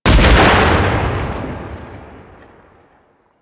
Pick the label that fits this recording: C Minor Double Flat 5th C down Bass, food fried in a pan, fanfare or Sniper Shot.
Sniper Shot